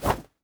Swing Punch; Afterbern Democralypse; Windows

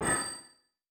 Boss Chain Blink; helltaker

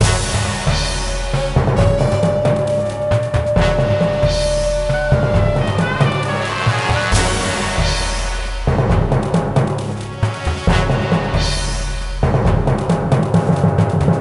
King Battle; Lego Battles 2009 NDS

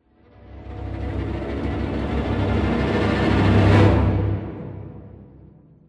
Music Ruin Intro

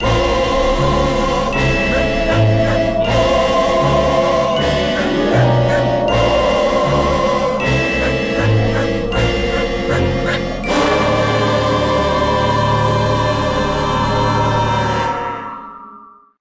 Course Intro Fanfare; crash bandicoot series sound box

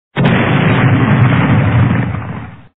Explode; bejeweled deluxe 2001 pc gamerip

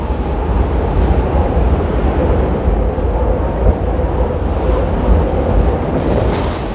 Wind; super trench attack

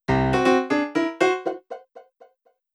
Jingle Reconciliation Failure

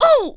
Female Hurt Grunt

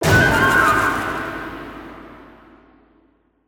Music Stingers - Battle Stinger

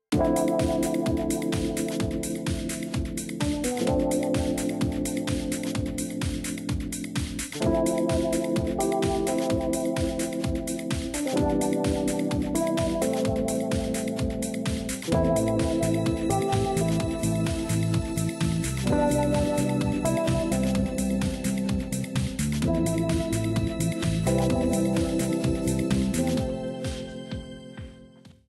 Tournament Music; Pool Nation; PS4 Windows Xbox One

